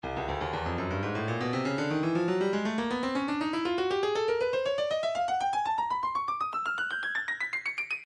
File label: breakbeat midi files 120 121 bpm 120; Bosso Club C; Stylus Breakbeat Midi Files